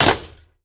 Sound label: Stone Jump; moul